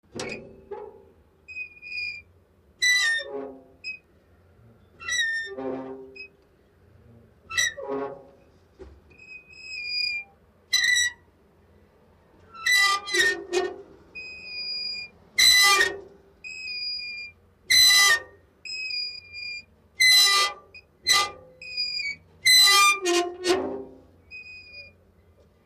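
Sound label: Mailbox Flap Metal Squeak, hinges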